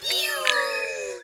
yeehoo

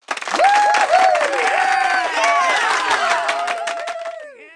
cheer

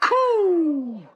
coo

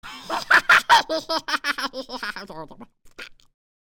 cackle

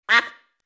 quack; Animal Sounds; Various Animals; Short calls

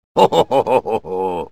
ho ho

